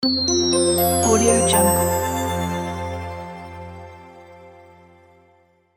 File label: corporate logo